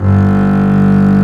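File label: STRINGS, Cb Bowed f; Roland L-CD702; Cb2 f vb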